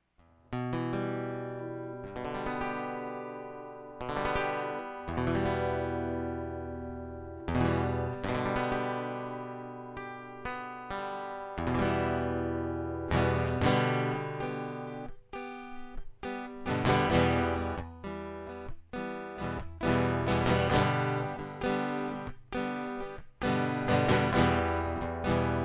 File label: Guitar Loop